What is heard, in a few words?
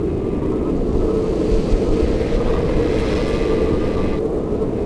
Ambient